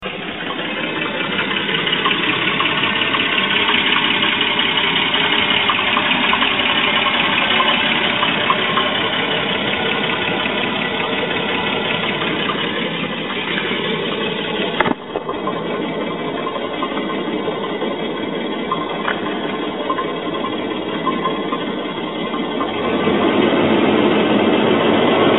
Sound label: filtered knocking